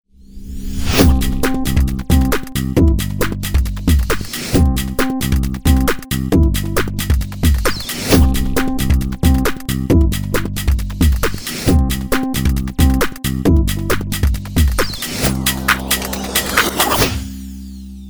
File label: BED Pleasant Beating